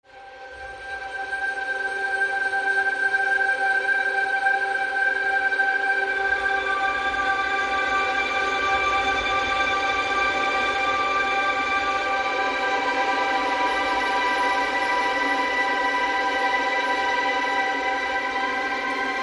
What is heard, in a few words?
Wondrous Layer